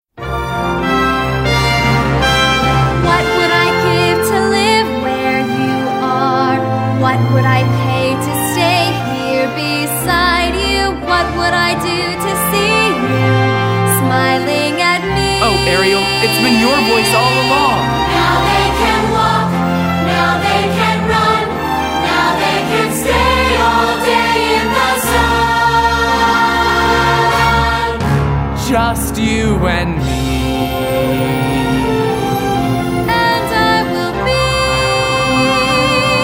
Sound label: vocals